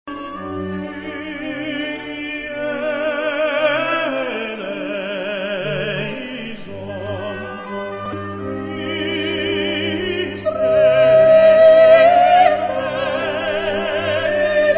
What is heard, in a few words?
Kyrie, tenor